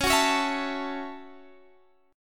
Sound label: A 6th C sharp